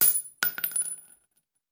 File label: Bullet Shell; Sound Ideas; Guns